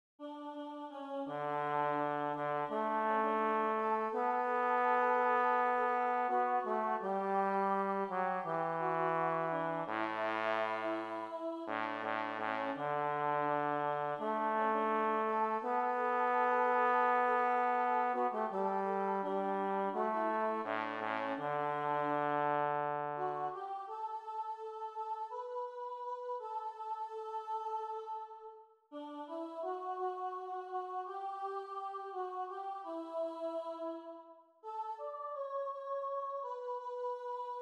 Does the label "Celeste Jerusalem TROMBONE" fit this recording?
Yes